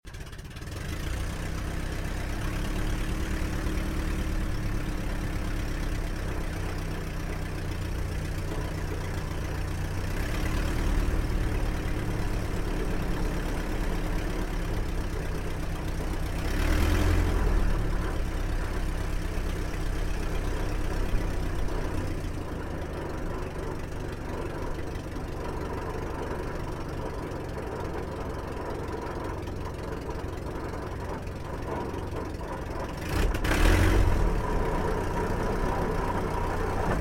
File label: aircraft 1943 dh82a dehaviland tigermoth taxi concrete interior stereo